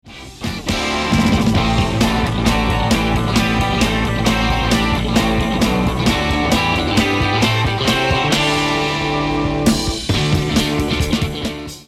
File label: Melody